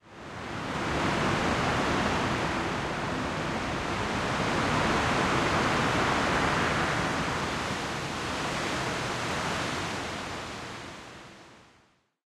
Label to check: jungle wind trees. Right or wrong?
Right